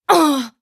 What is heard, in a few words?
Damage Female; gamerip; Car Simulator 2; android